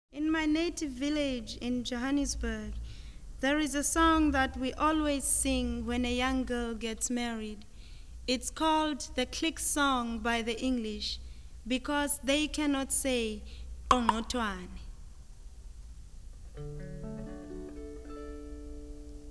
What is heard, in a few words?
Click Song